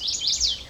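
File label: song chestnut warbler maine end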